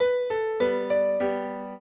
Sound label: piano; Mozart